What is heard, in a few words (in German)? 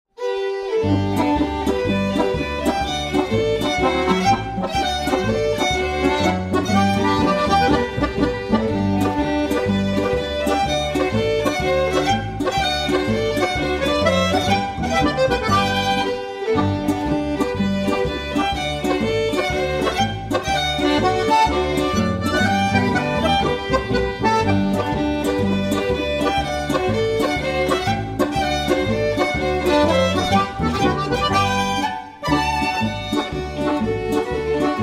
lustige musikanten polka